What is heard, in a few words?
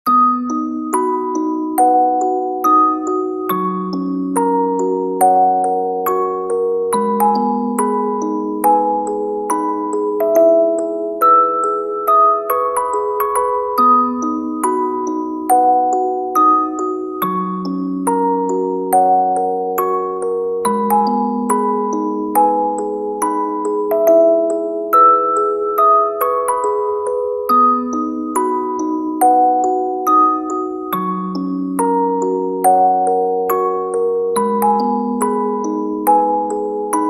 Music Box